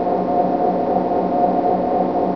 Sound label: elev shaft, ambient sky scraper; hl2